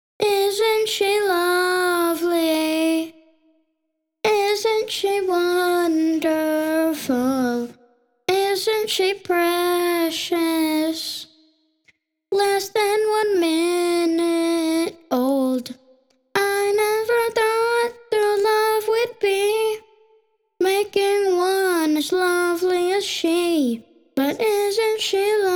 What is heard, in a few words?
Acapella